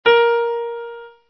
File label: ais1 b1, keyboard sounds